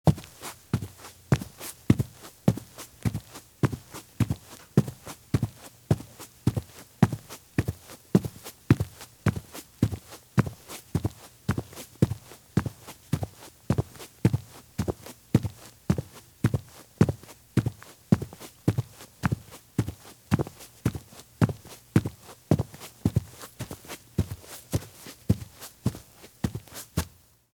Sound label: Men's Business Shoes Walk at Very Fast Pace on Grass; Sound Ideas; Footstep Sound Effects